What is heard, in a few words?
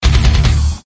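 heavy message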